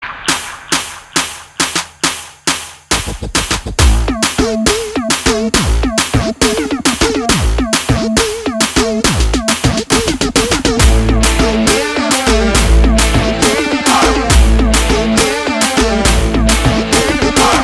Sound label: Title Song Music Ringtone; telugu movie